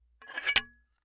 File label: Part Assembly; TOOLKIT vol.2